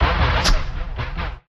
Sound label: Shaky Rebound Transition